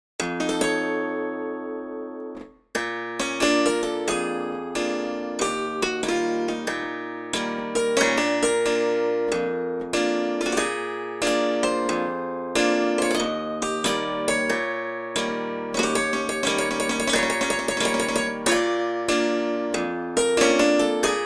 dolceola blues

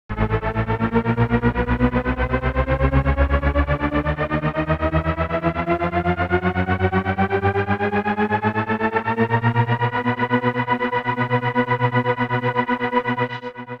fx iapetus

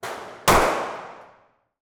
kill shot gun fire

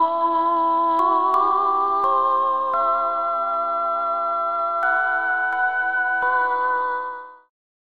sopranvox c4 c6

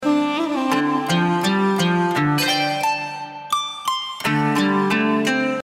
samurai loop lead

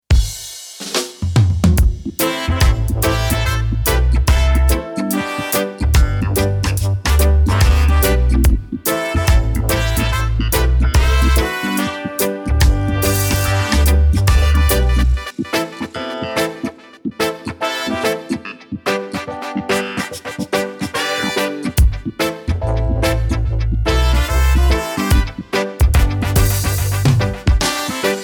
dub

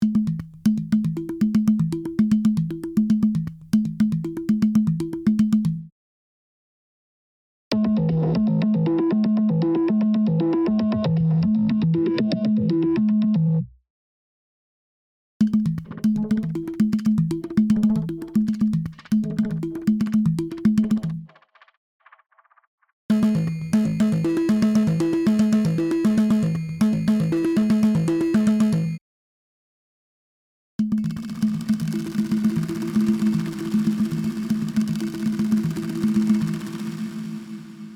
tabla

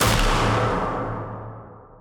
big hit; machine tribes

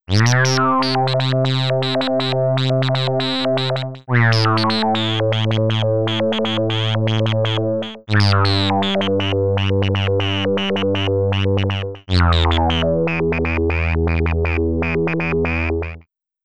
ring modulator

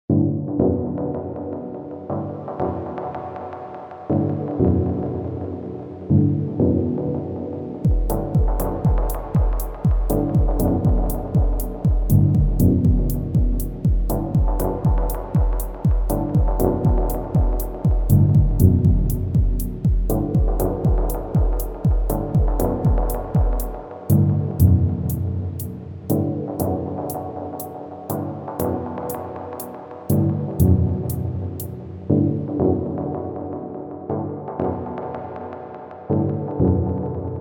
dub space 120 bpm

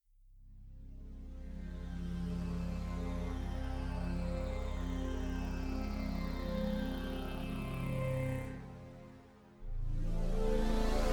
vortex soundwaves

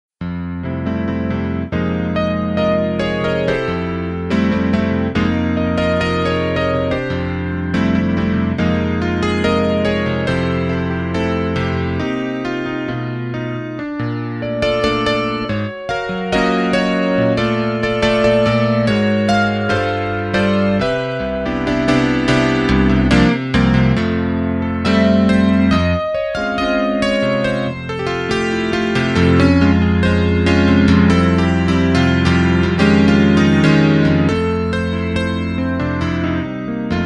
bossa

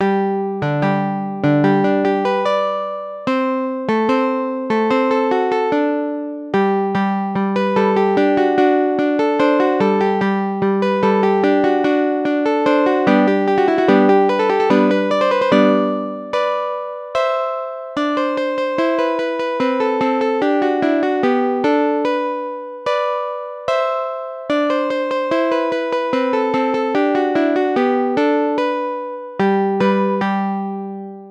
piano two

